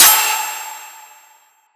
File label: snare